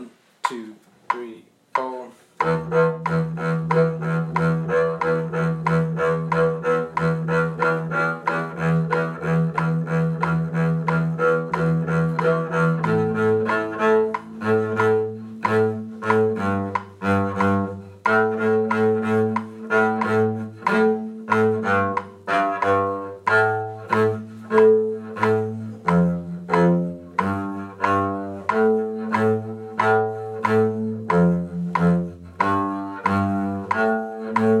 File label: explosion bass